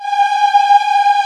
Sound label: A 5 CHOIR MX